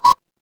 ui beep

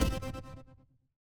retro game hit

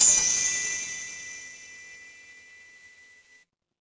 instrument chime bar

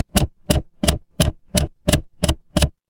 mechanical machine

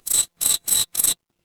animal insect

